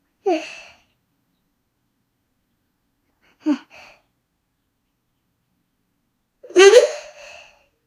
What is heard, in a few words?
female